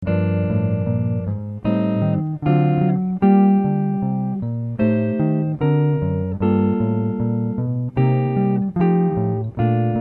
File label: chord